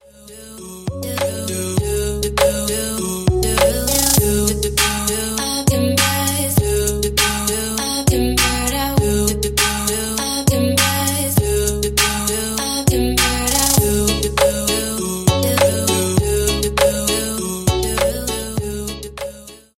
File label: Trap